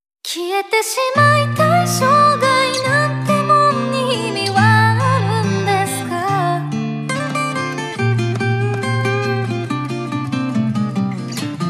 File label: Guitar